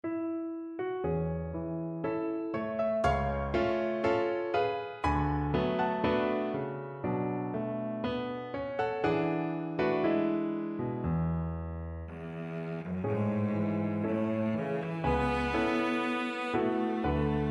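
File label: violoncello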